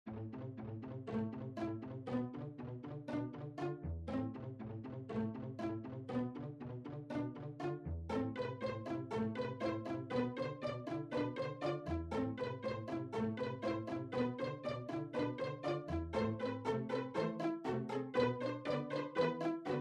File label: pizzicato